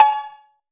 Cowbell Perc; trap; Percussion; Cymatics; Trap Starter Pack

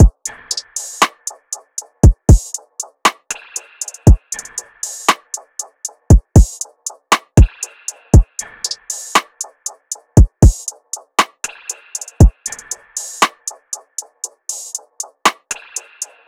How So Drum Loop - 118 BPM; Cymatics; Vibes II Drum Loop Collection

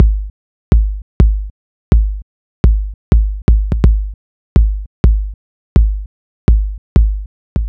Pepper Drum Loop - 125 BPM Kick; Cymatics - ANALOG EVOLUTION Beta

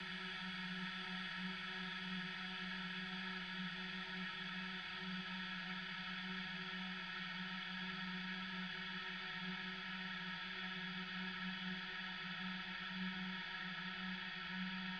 128 BPM F# Min Ambience; House; Loop Stems; Cymatics; Breakdown Loops; Mothership

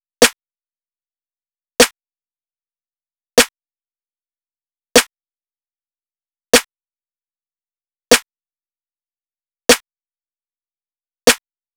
Drum Loop 152 BPM Snare; Cymatics; Vibes II Drum Loop Collection; Defendant Drum Loop

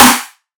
Snare; Cymatics; Future Bass Starter Pack; Drums - One Shots; Heater

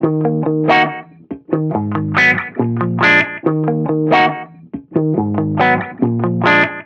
Reggae Guitar Loop 140 BPM D# Maj; Vibrations; Cymatics; Vibrations Guitar Sample Pack

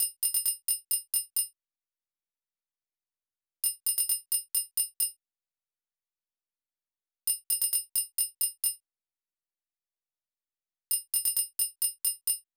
Drum Loop, 132 BPM Glass; Loop Stems; Cymatics; Vanquished Drum Loop

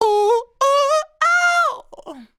Ad Lib Dry, 100 BPM C# Maj, Vocal; Cymatics; Euphoria Vocal Sample Pack; Ad Libs

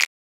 Snap; Drum One Shots

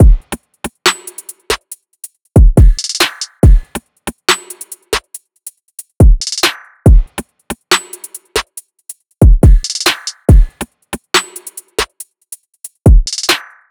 Drum Loop - 140 BPM; trap; Drum Loops; Banter Drum Loop; Cymatics; Trap Starter Pack

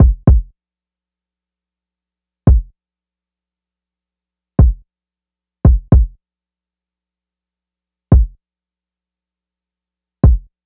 Diffuse Drum Loop 85 BPM Kick, Lofi; Cymatics; ANALOG EVOLUTION Beta

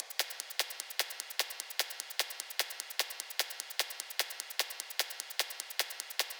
Shaker, Percussion Loops; Drums

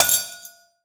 Organic Percussion Shot; Future Bass; Drums; One Shots

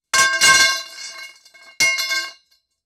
Metal Pipe Drop To Ground; Fairlight Sound Lib

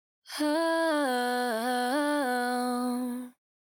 Ad Lib Dry, 100 BPM B Min, Vocal; Cymatics; Euphoria Vocal Sample Pack; Ad Libs

